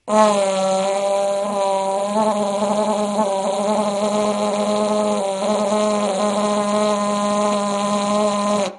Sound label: Vuvuzela